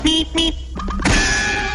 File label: bip bip